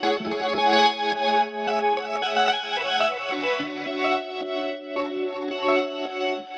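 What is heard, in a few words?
GUITAR WET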